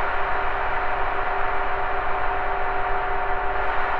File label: InfiniVerb Noise, sparse soundscape Loops